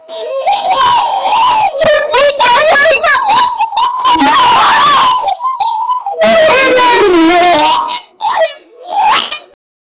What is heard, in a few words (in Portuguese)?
som gelationoso chacoalha